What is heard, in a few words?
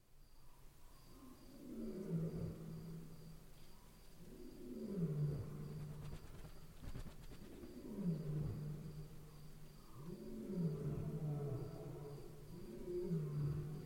lions and insect-hunting bats flapping wings; soundscapes